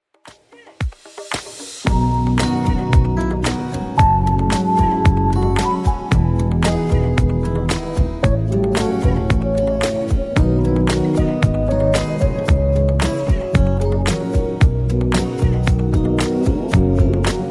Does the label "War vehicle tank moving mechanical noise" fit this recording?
No